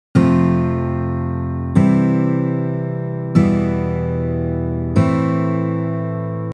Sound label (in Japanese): せつない 通常; emo code 4types 3steps